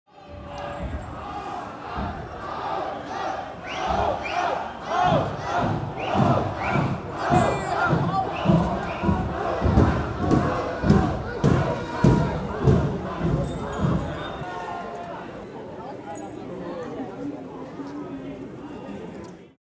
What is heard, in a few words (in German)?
Gegroele